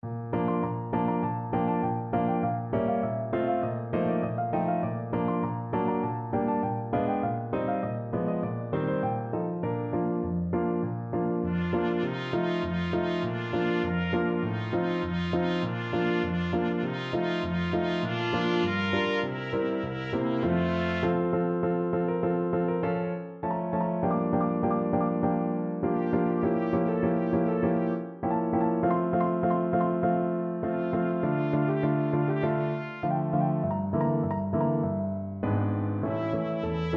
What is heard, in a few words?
Trumpet Trio